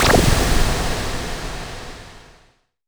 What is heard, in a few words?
Impacts